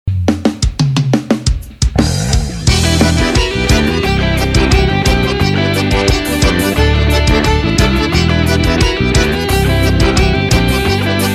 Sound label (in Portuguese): forro muito pegajoso